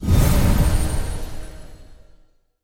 horror game magic holy bolt shot wind whoosh mystical cast spell